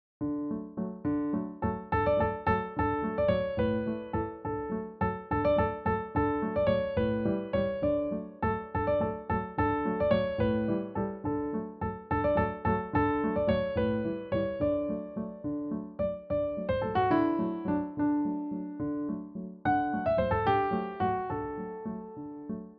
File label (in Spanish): Valses